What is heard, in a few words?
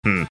hmm